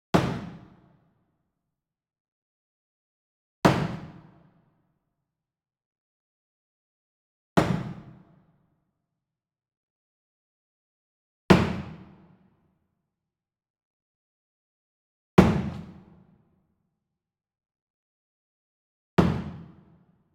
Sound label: SWEETENER Wood Box Hammer Scrape Hit Medium; boom library; Cinematic Strikes Construction Kit